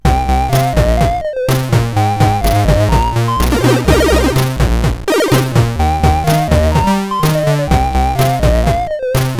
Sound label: sid CSG8580